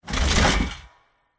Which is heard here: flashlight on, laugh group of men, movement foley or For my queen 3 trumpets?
movement foley